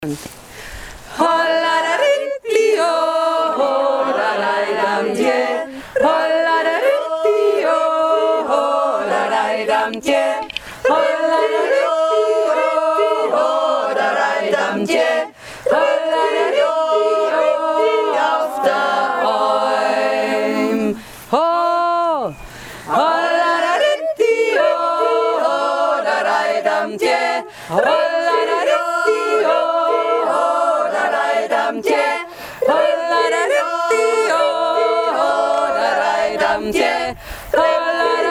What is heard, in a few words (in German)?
echojodler; admonter